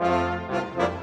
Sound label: Brass; Sonic Foundry (Sony Creative Software); Orchestral VOL-2; Modern 31